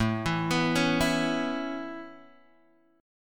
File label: A Major Add 9th A Guitar Open G, chord